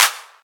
Natural Trap Hand Clap Sound E Key, hand clap sound tuned to the E note